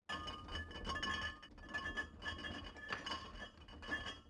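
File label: crate cocktail; bedna koktejl; Mafie 1 cz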